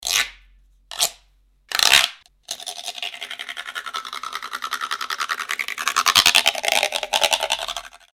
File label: guiro